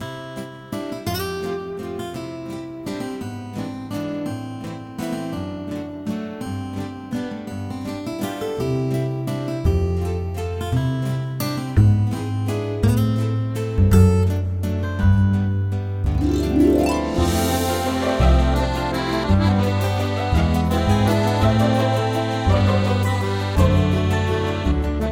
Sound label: Restaurant